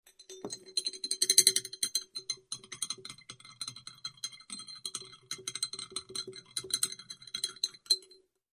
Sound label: Beaker Stir Wood Stir